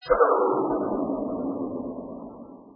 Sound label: sound bell